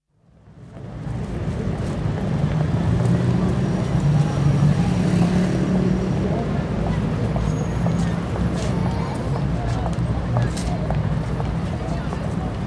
Close perspective international crowds passing with heavy traffic background; Rec Oxford Circus; BBC